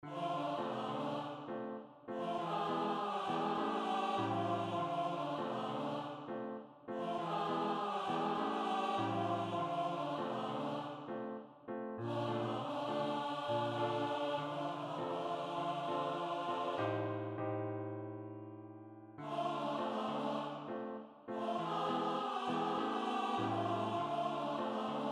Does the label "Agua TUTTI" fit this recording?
Yes